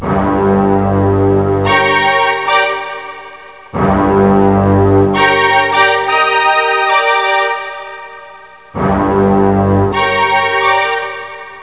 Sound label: Orch; WARLOCK; KT.Orch1